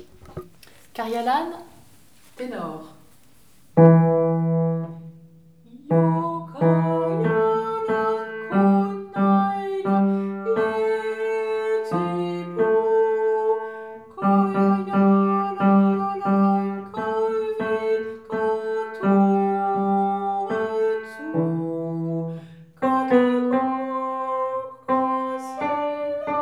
voix de ténor

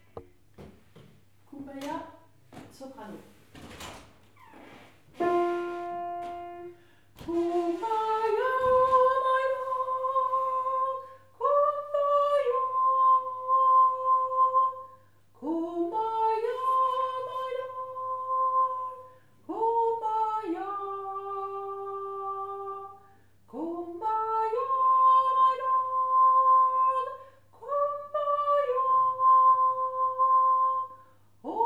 Kumbaya Soprano

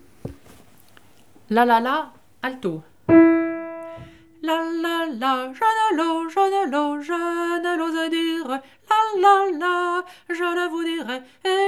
La la la alto

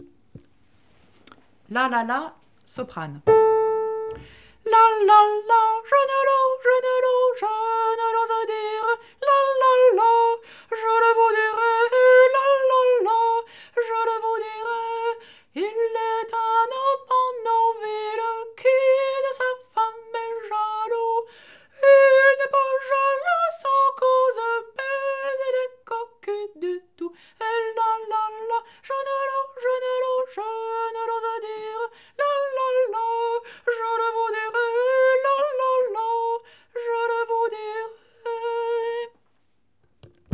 La la la soprano

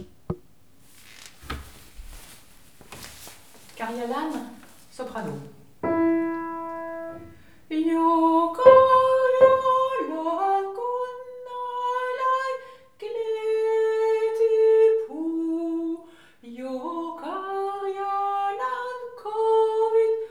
voix de soprano

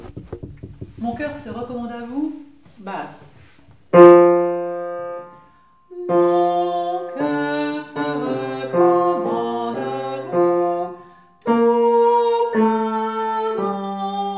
Mon coeur se recommande à vous Basse

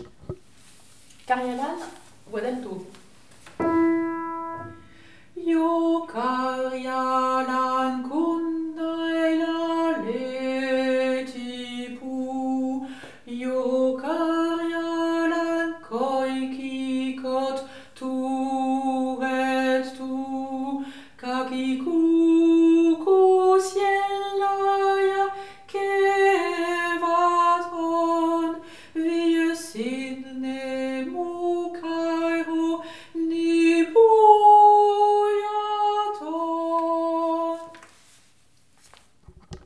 voix d'alto

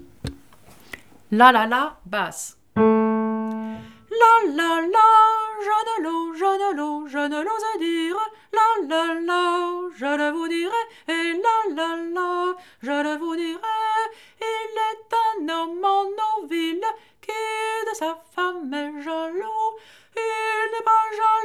La la la basse

